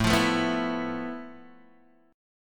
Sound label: F Major Sharp 11th A